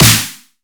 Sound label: Snare, Dubstep One-Shots Drums